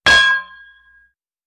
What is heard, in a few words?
Ding Metallic Hit, chimes & bells